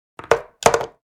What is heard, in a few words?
Wood hairbrush set down